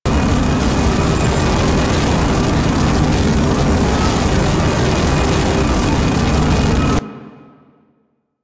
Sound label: Mech Jump Boost Loop; Nechro Mech; Vehicles